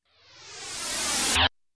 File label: Hairy Synth Riser